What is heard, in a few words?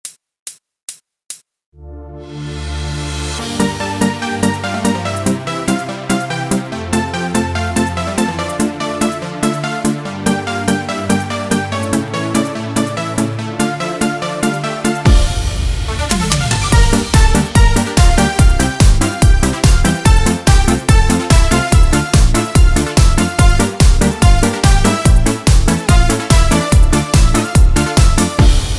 Midi Yamaha; yamaha psr s750